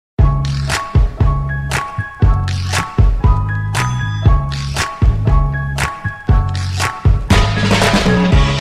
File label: Music; New Musica